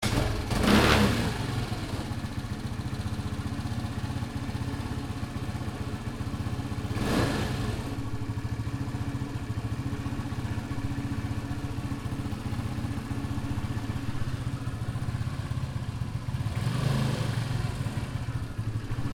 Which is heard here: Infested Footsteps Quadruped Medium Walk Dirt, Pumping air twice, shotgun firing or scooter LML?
scooter LML